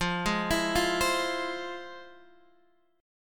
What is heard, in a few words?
F Minor Major 7th, Guitar Standard, chord; FmM7 arpeggiated; JGuitar